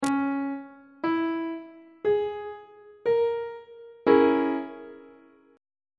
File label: c sharp minor 6th chord on treble clef